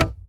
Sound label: Arrow Impact wood; SNKRX